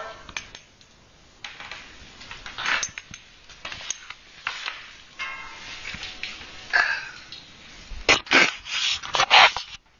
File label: Unexplained cord noise